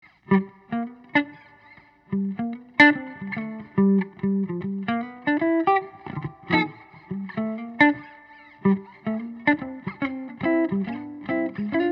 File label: amp, archtop guitars, electric; wenge; spruce; LHT Fugue